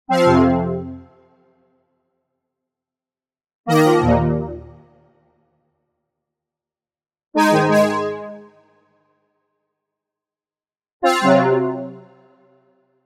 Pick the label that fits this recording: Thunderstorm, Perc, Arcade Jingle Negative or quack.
Arcade Jingle Negative